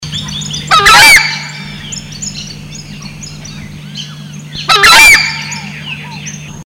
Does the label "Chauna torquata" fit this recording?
Yes